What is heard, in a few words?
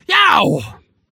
Environment - Walking on Hot Rocks